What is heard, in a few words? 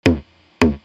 bass d, techno drum; custom kit